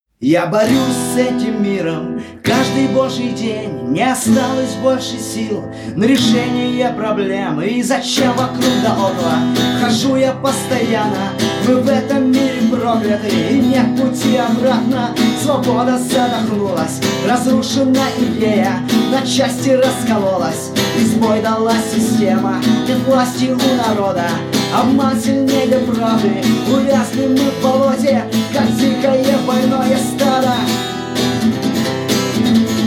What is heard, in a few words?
acoustic